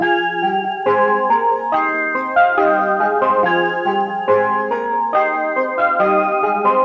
Nostalgic Melody Loop - 140 BPM F Min; Cymatics; Oracle Sample Pack

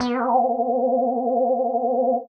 comical effect, Cartoon Film Effects

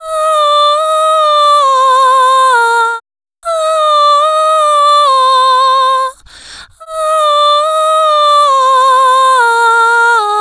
Oh Vocals Outro - Cm 70bpm No Panning; Construction Kits; Ghosthack; SkyRealm; Kit 19 - Ode To Daenerys

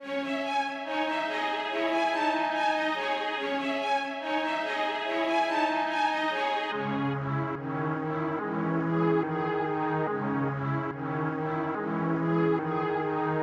strings, 143 BPM C# Min; Rome

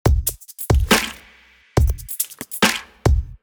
Drumloop, Dubstep; GhostHack

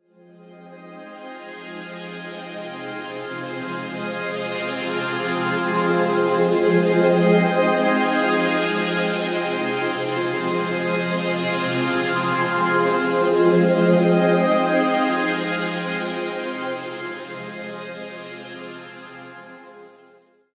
Pad F; One-Shots; Pads; Ghosthack; Color Bass Essentials

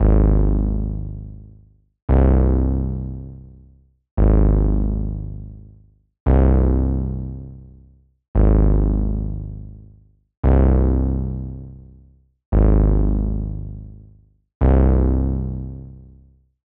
115 BPM C Min Bass; Cymatics; Agony; PHARAOH

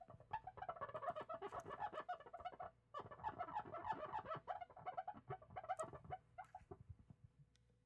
Cleaning Glass with Cloth; Fairlight Sound Lib

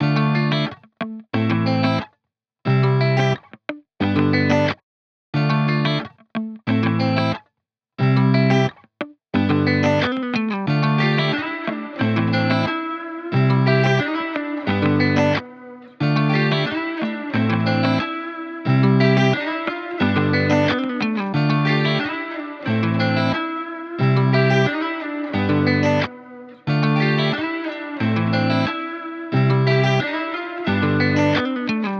90 BPM A Min, Guitar; Brand New; Cymatics; Miami Guitar Pack - Pt 1

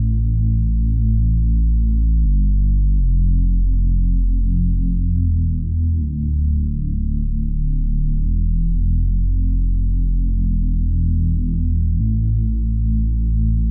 160 BPM A Min Bass; RnB; Loop Stems; Cymatics; Loyal; 2022 Melody Collection; RnB Pt 2

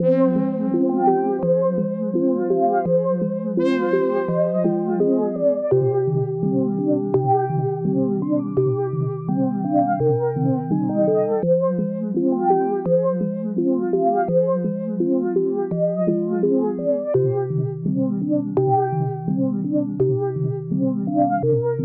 168 BPM C Min Main Synth; Loop Stems; Cymatics; 2022 Melody Collection Platinum Expansion - Pt 1; Foreign

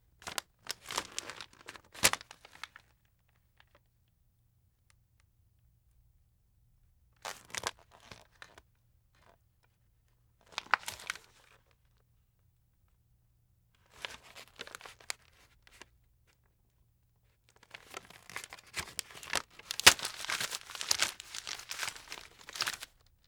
Paper Movement Paper Crumple; Fairlight Sound Lib

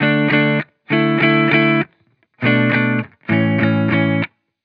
Vibrations Pop Guitar Loop 100 BPM D Maj; Cymatics; Vibrations Guitar Sample Pack

